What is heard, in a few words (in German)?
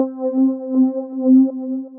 Fett Wah, Synth Keys